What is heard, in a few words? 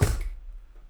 hit